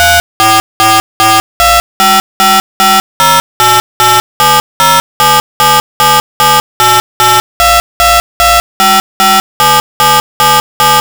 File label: dtmf